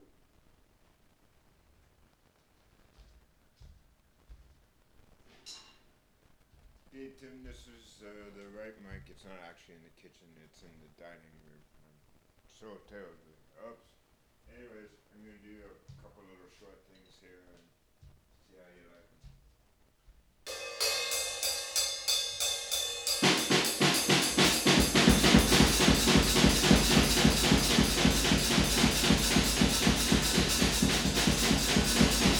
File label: drumtrack